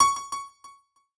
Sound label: key C#